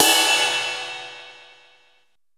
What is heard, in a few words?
drum a#2, DRUMCOMPUTER YAMAHA R5+RX7; Best Service ProSamples vol.55; Retro Sampler